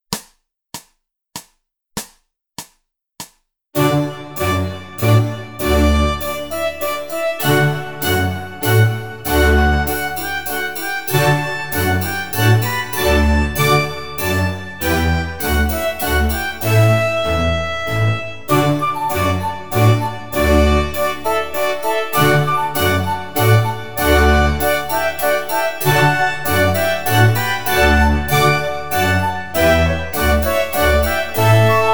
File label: Allegro slow